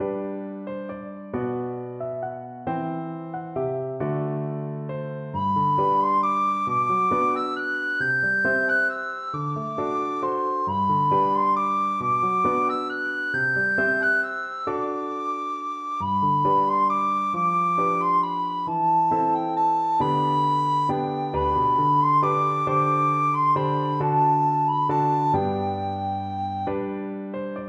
what shall we give, recorder

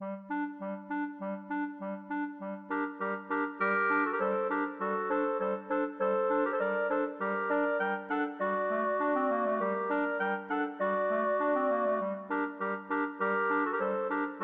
clarinet trio; danses des cygnets

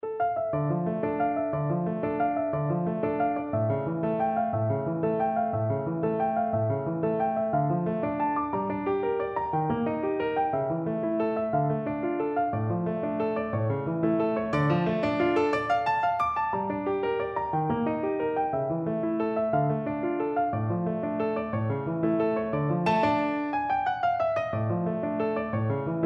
tempest, piano; beethoven; op31 no2 3rd mvt

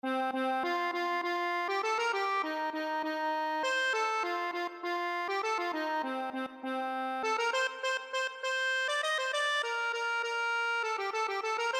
accordion

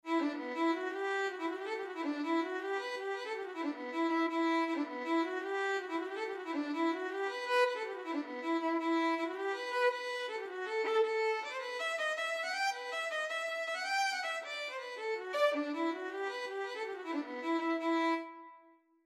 okane violin

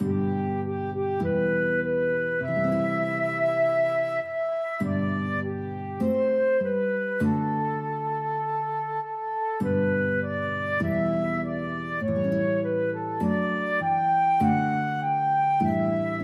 crown him, flute guitar duet

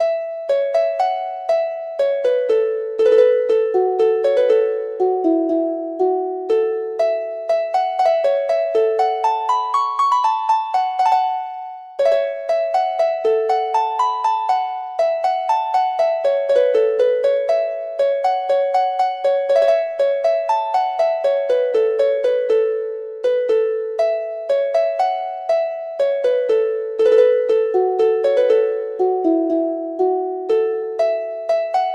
harp

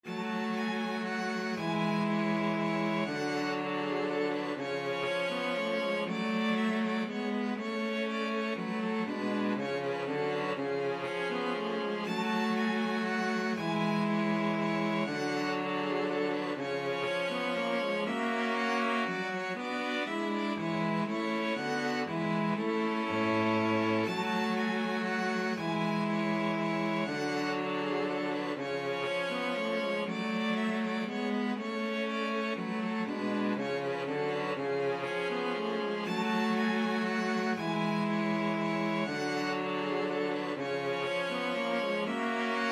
minuet in g minor, string quartet; bach; bwv anh 115

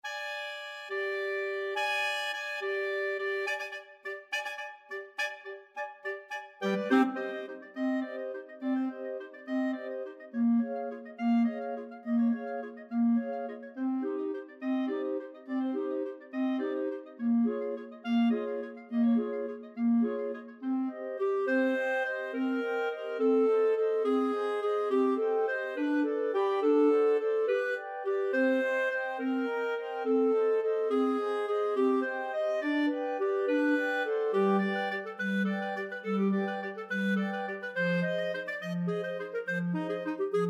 5 clarinet, clarinet choir

